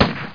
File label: shotgun; CIVILWAR